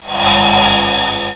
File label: rev cymb; Star Trek JR Audio